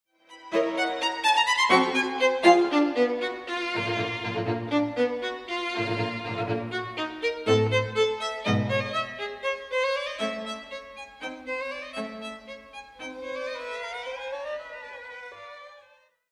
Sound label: Cello